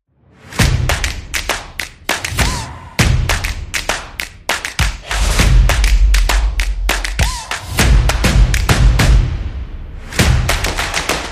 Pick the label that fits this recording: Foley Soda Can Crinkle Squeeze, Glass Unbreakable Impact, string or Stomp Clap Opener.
Stomp Clap Opener